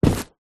foley footstep single shoe softly slight tip toe carpet walk